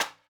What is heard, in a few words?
wood hit